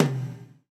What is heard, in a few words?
GATE TOM, Drums Acoustic; USB Soundscan vol.10; AKAI; 90s sample CD; GATEKIT 1